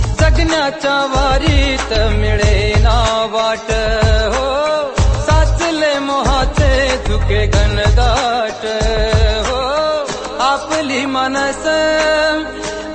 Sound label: Marathi tone ringtone